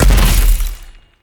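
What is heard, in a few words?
metal shield, impact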